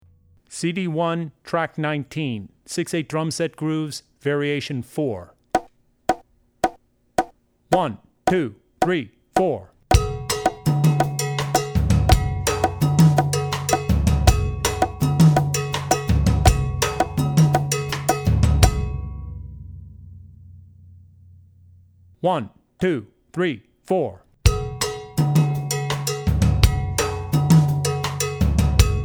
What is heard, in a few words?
6 8 Drumset Grooves (Variation 4); Drummer's Bible Tracks